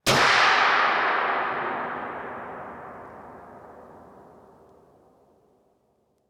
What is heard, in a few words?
Impact Rock Drop With Echo; Impacts